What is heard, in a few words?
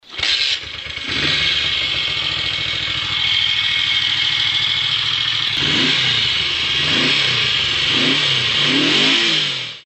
ducati 350, motos